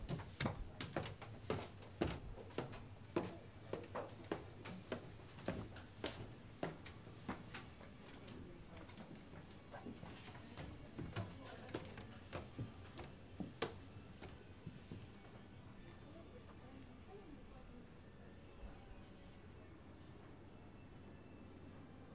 Bookshop Shop Walking Upstairs Some Voices

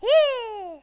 Bleeeh

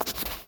Scribble

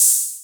Hat

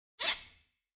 Swipe

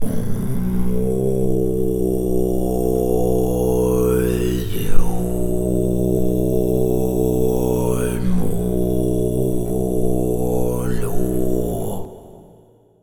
Low Chant Reverb

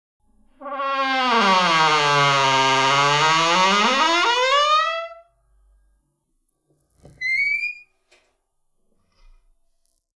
Very Creaking Door

